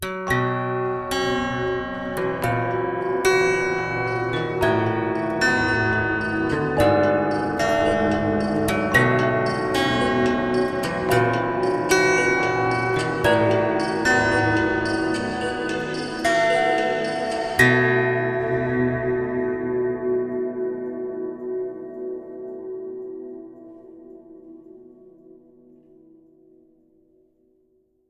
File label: campfire resting dark; curious expedition 2 macos ps4 switch windows xbox one xbox series xs gamerip 2021